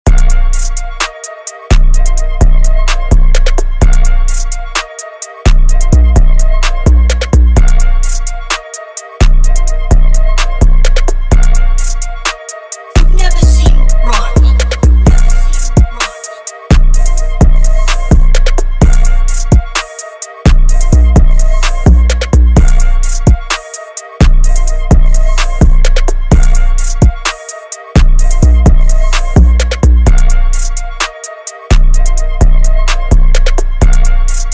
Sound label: Instrumental